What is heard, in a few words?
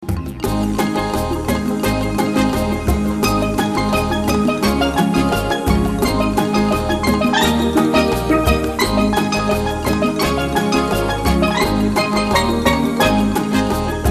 Limbo